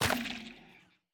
幽匿破坏声

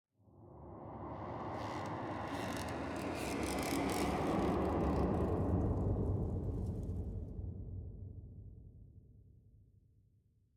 绯红森林点缀声; ambient

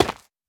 玄武岩破坏声

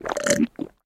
蜂蜜瓶饮用声

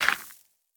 刷子刷沙砾完成声

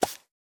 刷洗犰狳声

